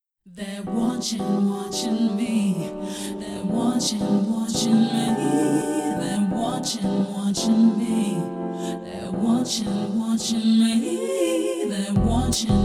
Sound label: vocal chill 432 Hz